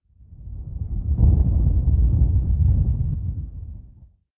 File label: explosion; zero-shelter